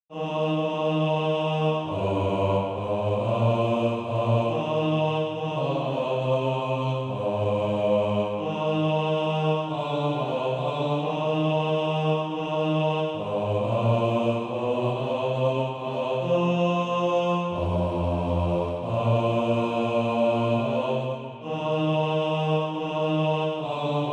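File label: bass, Practise Music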